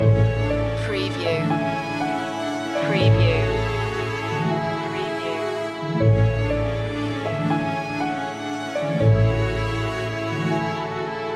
lake waltz loop

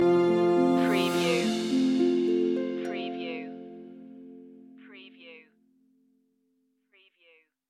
orchestral loop end; the holly and the ivy; media music now

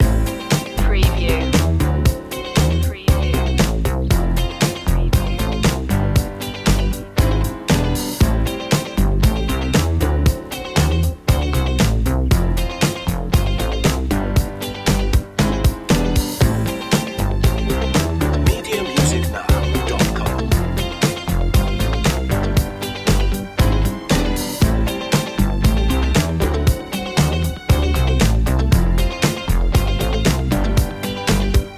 boogie disco

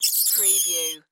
Monster Small Furry; sound-effects-bible

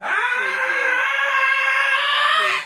Scream Processed; sound effects bible

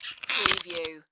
Celery Crunch Squeak

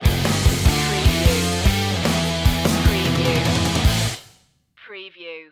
japanese cartoon theme stinger

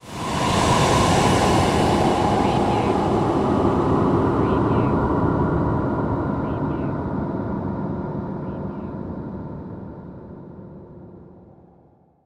Whoosh Slow

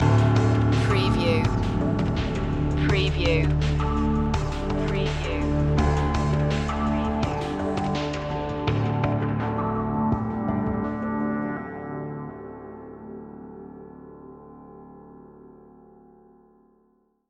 no hang drum

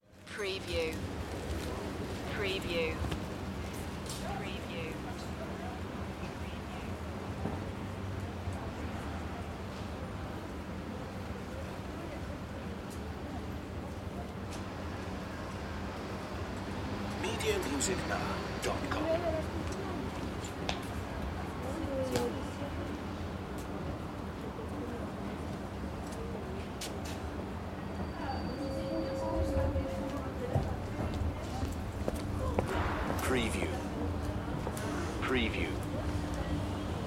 France City Street; sound-effects-bible

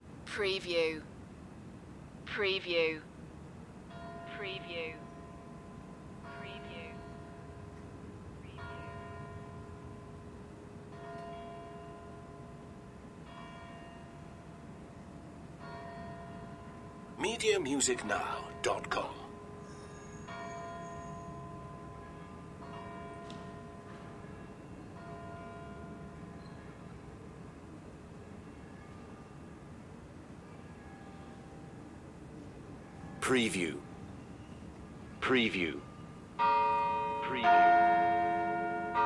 church bells spain

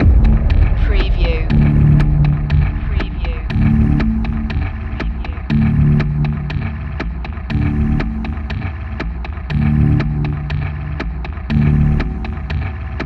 interference loop

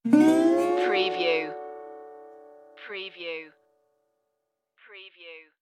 starry western night stinger

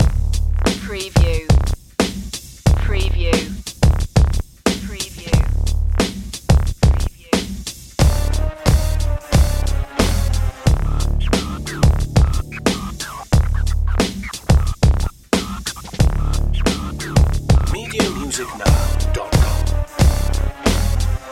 walk da raptor loop

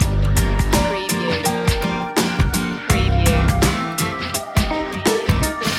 texas sun loop

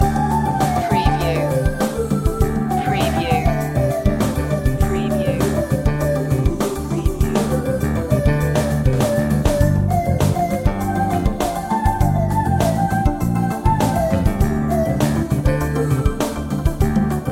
fusion break loop

